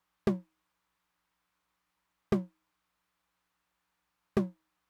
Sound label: high tom, Roland TR-909; Roland TR-909 Ableton Project